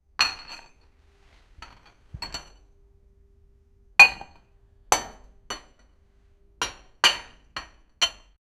clinking of dishes sudden

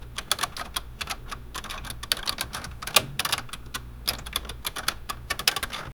box register sound

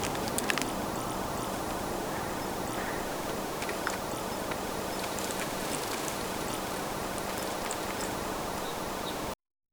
quiet forest atmosphere, leaves rustling

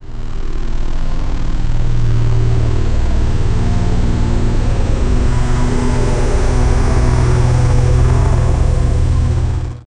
helicopter sound

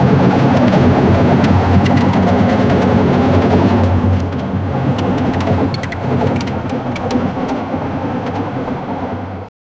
military tank sound

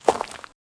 wet slimy footsteps on so, squish

